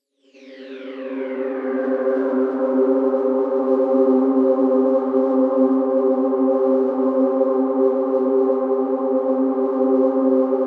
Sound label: pad sweep wind choir; flaechen